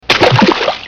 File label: Splash, Water; Ringtones